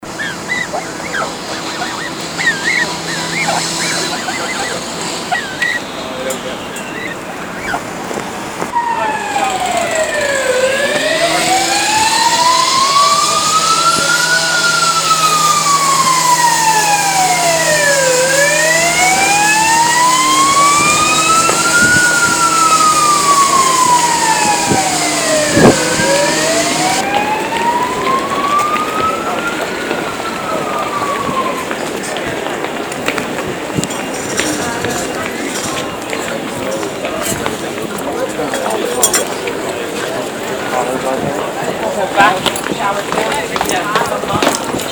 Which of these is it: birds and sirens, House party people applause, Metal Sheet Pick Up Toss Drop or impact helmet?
birds and sirens